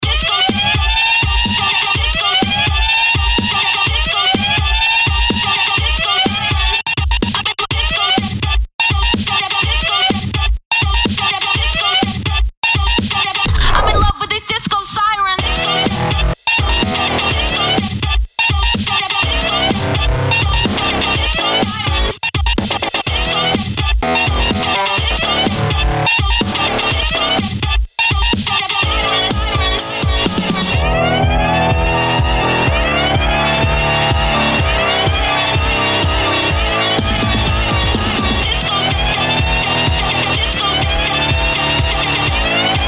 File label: disco sirens remix